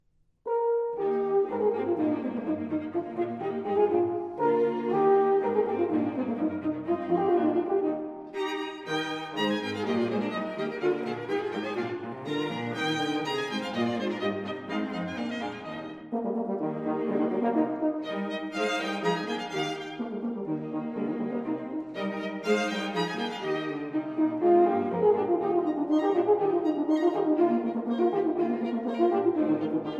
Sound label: Quintet For Horn, Violin, 2 Violas and Cello in E-Flat Allegro